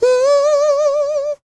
MMM, Vocal Blues Soul; Spectrasonics; Planet

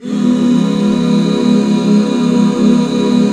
Db 7, back chords; Sound & Vision - Gigapack II CD 1

